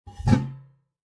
Pot

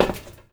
Step Metal Iron

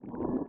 Hunger

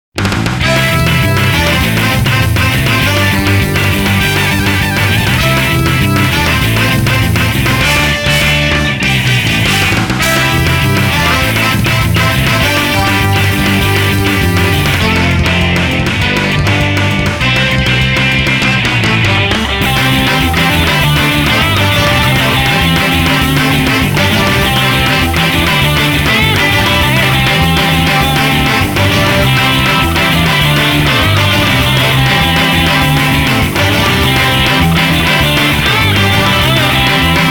Ska

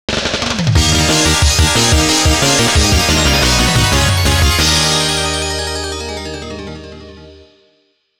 Stage Clear; battle crust

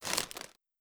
Impact Chipbag

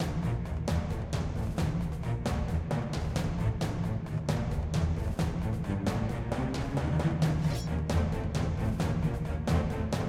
Beat

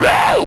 Vocal Pitched Roar

